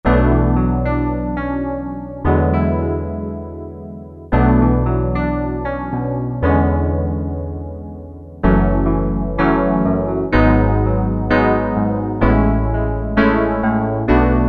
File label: Chorused Piano, Roland MKS-70